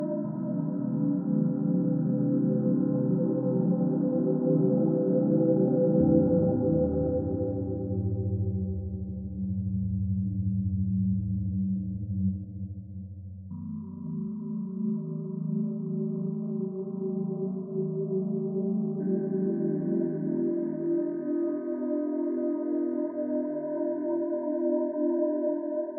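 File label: ambience slow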